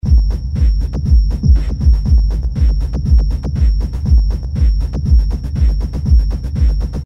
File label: rhythm industrial; Sound Graph